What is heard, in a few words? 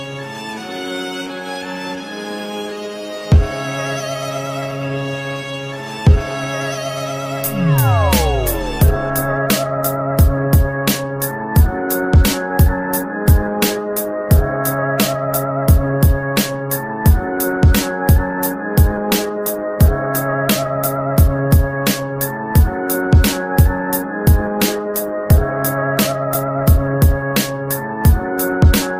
HIPHOP INSTRU